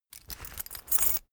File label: key ring mid size pocket put